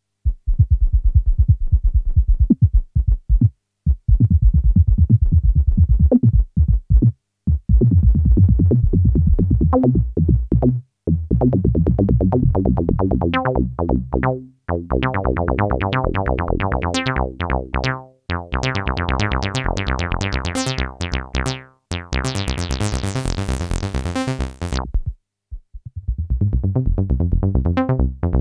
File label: JX10 filter bass Saw